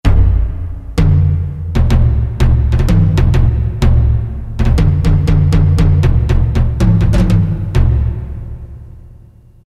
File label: Taiko, Roland MT-32